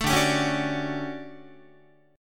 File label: D Major 11th D down Guitar 4A 4E 5A 5D 5G sharp 6C sharp; DM11 strummed